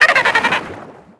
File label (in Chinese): 海豚 移动; 红色警戒2; RA2; 作者：西木工作室